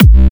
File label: Kick Bass Dancehall C